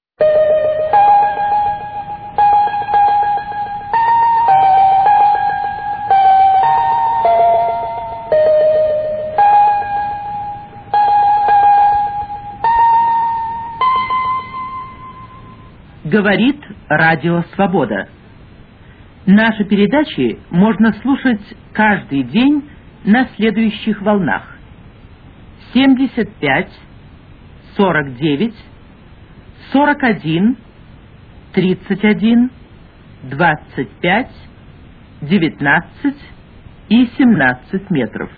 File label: russian, interval signal; usa; rl fdxa c1976